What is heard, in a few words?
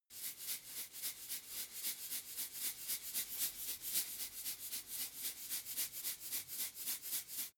salt shake sequence